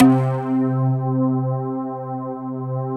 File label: SYN K-2000, Roland